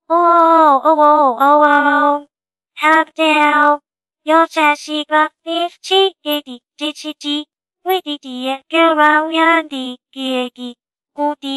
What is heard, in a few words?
computer stuttering; mr fun